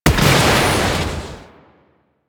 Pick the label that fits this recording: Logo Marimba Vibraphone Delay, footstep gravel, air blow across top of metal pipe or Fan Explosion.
Fan Explosion